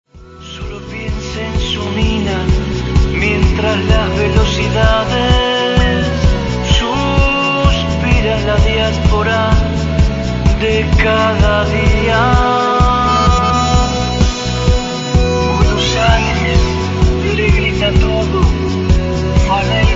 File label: tango